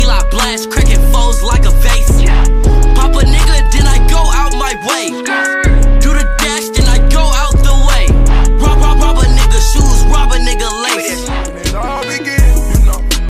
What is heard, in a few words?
new hip hop, ringtones